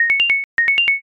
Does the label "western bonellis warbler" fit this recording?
No